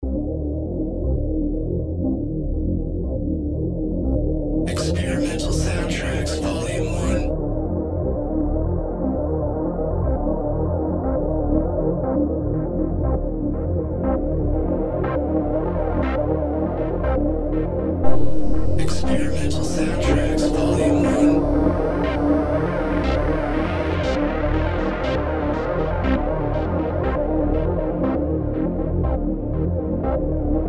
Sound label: Tense Noise ARP